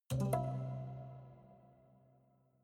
haunted strings chord major damped

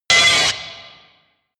distortion

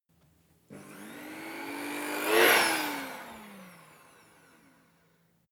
fly by small motor medium

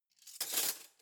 cutlery spoons drawer place

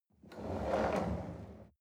chair wheel roll on floor soft

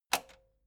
metal button spring click slow

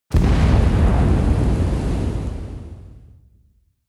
missile large fire stationary distant

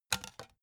cd take out tonal

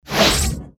agressive swoshes medium short

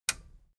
metal button radio push disable

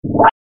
short movement sound

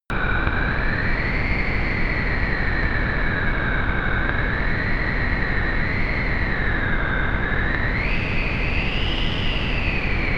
screaming storm loop

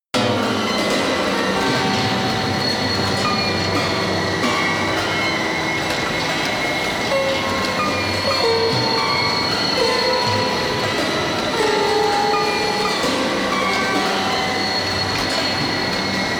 broken piano chaos

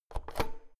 oven knob press in hard

